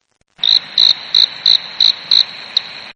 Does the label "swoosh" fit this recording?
No